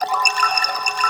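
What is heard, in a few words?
ambient pad, synthesizer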